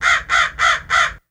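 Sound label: Crow Caws Fast X4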